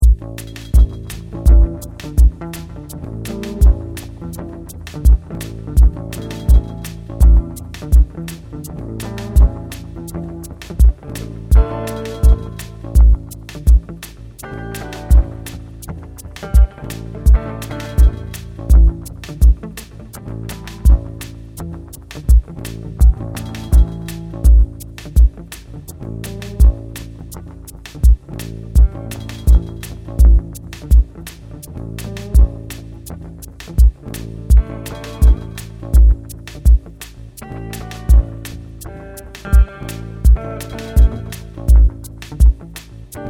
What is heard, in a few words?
rock